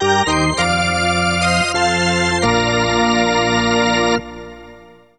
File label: Hockey Organ USA